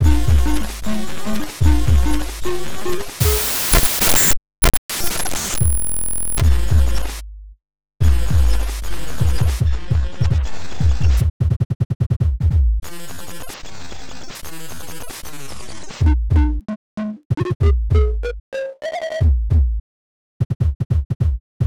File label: sonified synthesized loops